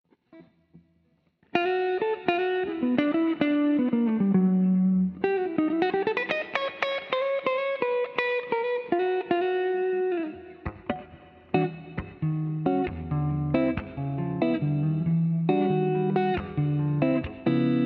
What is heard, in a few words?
Reverb, guitar